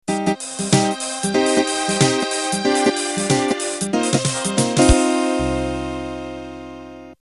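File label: Swing Rock End, hip-hop, MIDI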